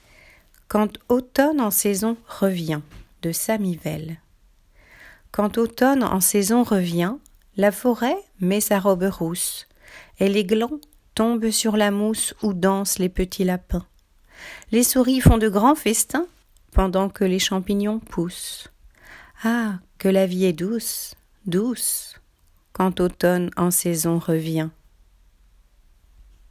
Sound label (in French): Quand automne en saison revient